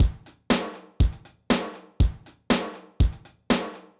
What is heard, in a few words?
drum RX950